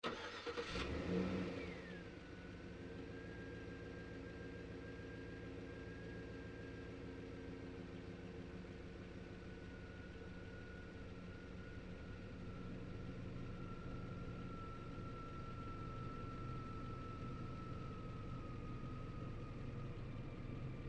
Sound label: Truck GMC Savana Onboard Start Idle Off; Trucks; Vehicles; 2010 GMC Savana